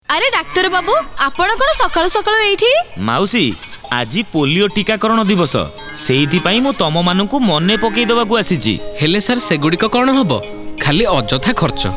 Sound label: jingle; radio4child awards 2024